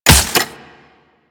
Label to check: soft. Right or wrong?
Wrong